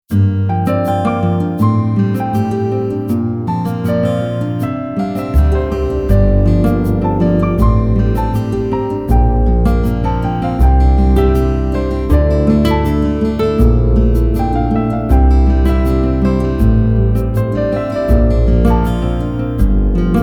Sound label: MUSIC with piano